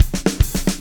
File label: POWR, Backbeat, SHUF